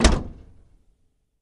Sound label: Camaro Door Close Hea, chevy camaro